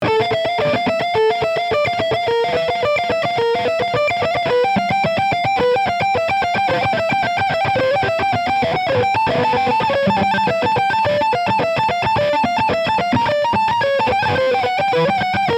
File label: Lead Stereo New Tubes; Bugera 333